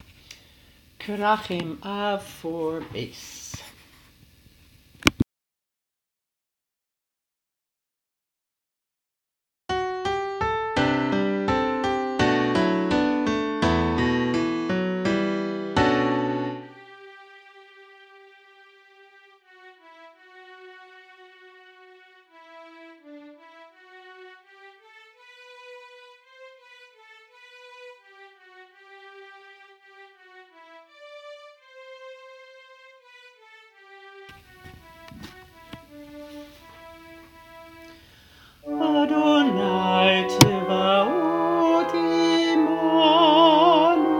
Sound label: bass